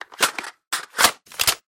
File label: mac10 reload, guns